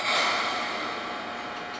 Air Zero, train; OpenBVE

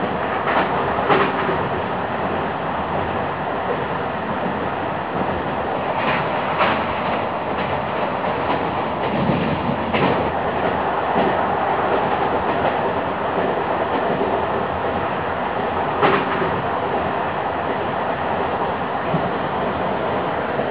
Run, Train; OpenBVE

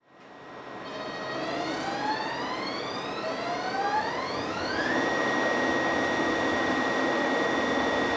CP start, R62 Kawasaki, Train; OpenBVE

